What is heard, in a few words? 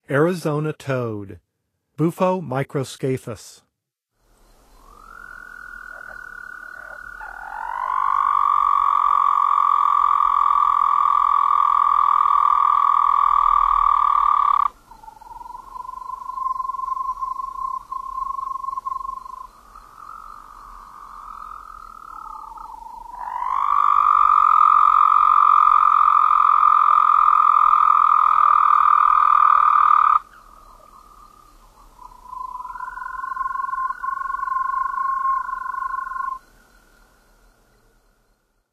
Arizona Toad; N. America; Songs of Frogs & Toads of North America